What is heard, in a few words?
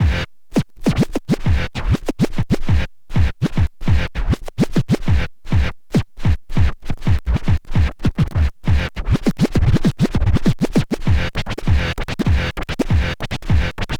scratch long, Vinyl Attack, scratches; Masterbits; scratches mix